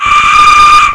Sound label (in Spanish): aire; mctlapitzali